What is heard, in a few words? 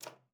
KLPB D4, ALTOSAX; Xsample VOL-2